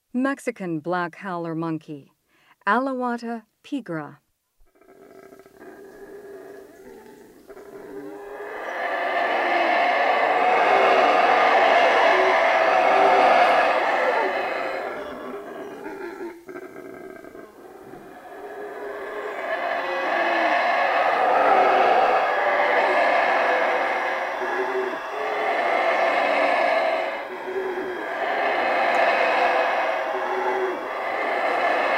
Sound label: Mexican black howler monkey; S. America; Rainforest; Primates of the Rainforest